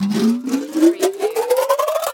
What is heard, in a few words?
CARTOON POWERING UP